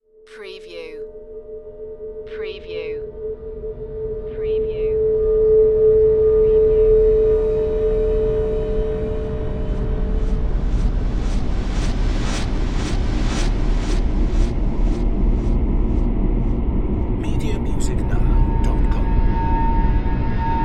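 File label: Production Element Sweeper; Sound Effects Bible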